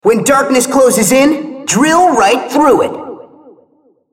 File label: attack; RumbleSkin03